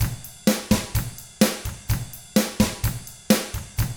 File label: 127 SURF ROCK